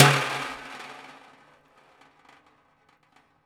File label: Prepared Drums